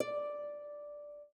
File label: Harp, notifications; OnePlus 12